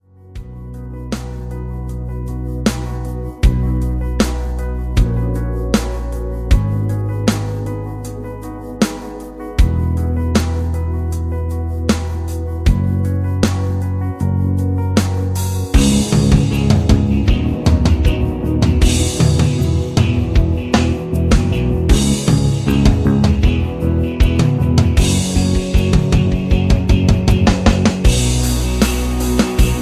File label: rhythm